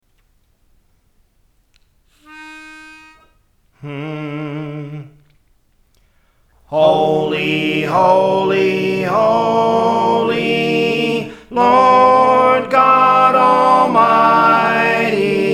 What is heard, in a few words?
Soprano; Praise For The Lord Training